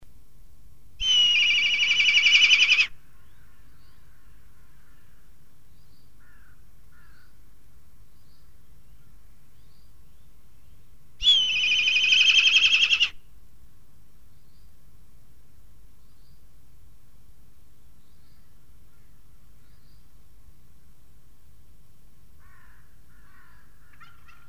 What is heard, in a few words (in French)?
Milan, Oiseaux; Sons Oiseaux